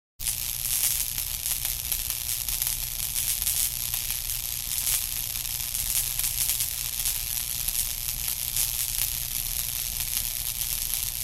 High Voltage Electrical Sizzle Steady; Electronic Sci-fi

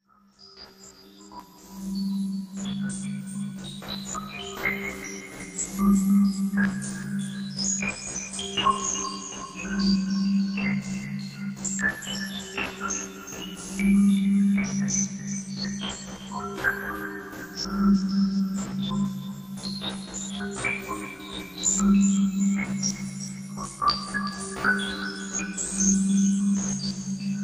Arp Texture; Beds

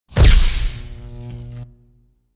Whipping Sizzle; DJ sounds

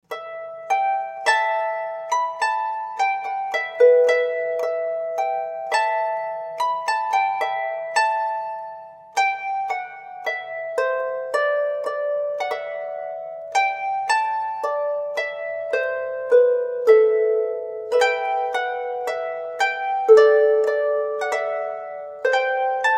traditional Scottish

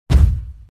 Short Poof Flash; Hollywood Edge Sound Designers Tool Kit

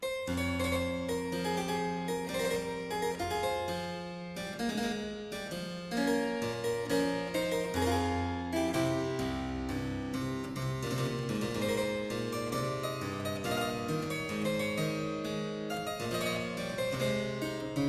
g minor, Harpsichord; Suite in g minor - Courante; Chambonnieres

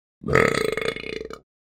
Belch Long Disgusting Burp Close Perspective; Human Sounds

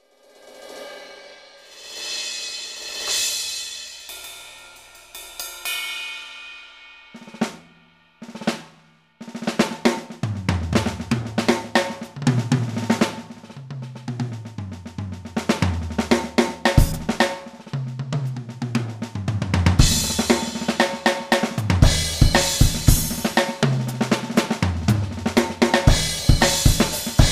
SD-90 Drums; SD-90 MIDI

